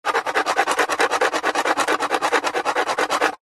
foley drink can empty crushed scrapes scratches fast on concrete; zapsplat